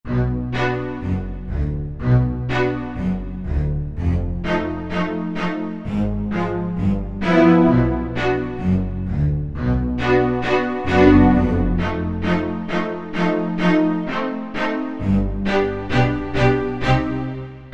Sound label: Bowed Strings, Korg Wavestation A-D